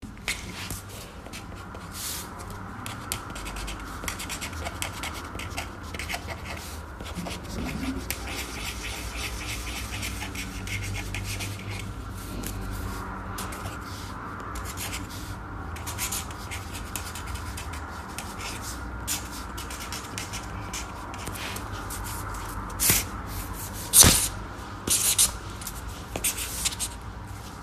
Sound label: Paper Instrument